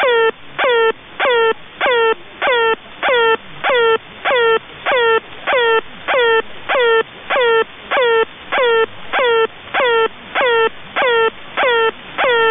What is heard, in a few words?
airhorn